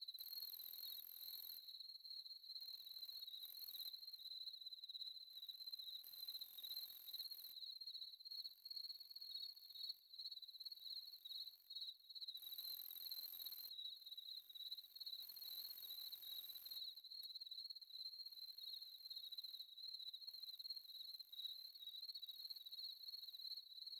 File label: ambience crickets; Rust